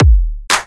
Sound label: drums